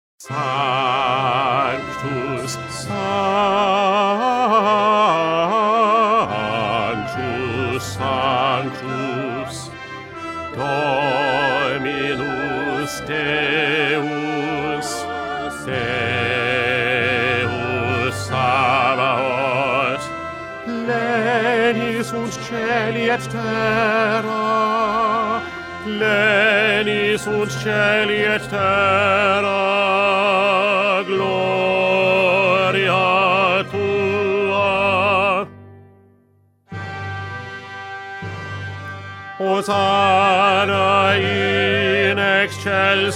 Bass; Mozart; Coronation Mass

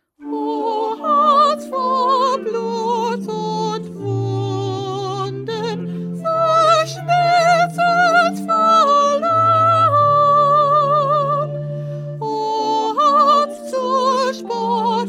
Soprano

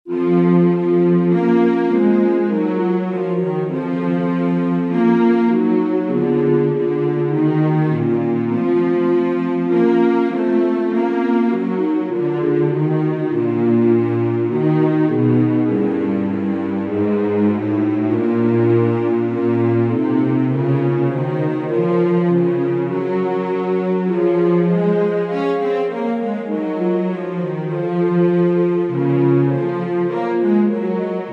Instrumental; Bach; St John Passion

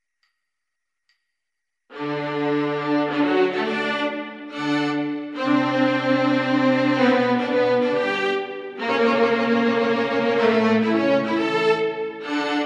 Instrumental; Schubert; Mass

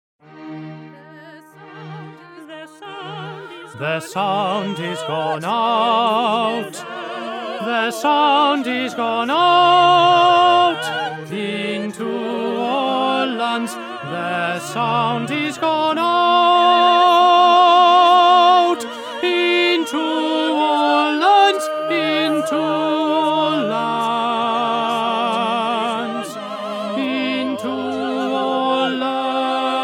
Tenor; Handel; Messiah

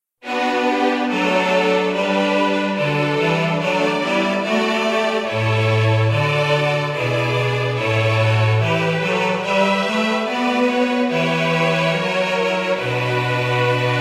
Instrumental; Bach-St-Matthew-Passion-3

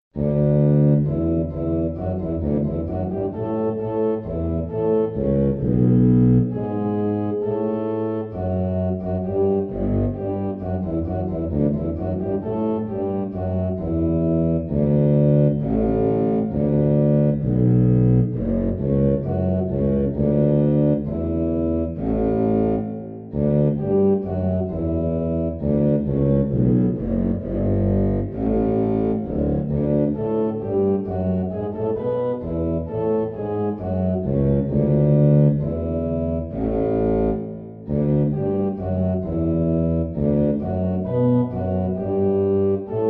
Magnificat Instrumental; Bach

